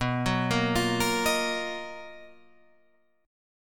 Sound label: B Major Sharp 11th